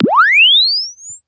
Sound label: Press Two Sides Chirp; Samsung 4 Recordings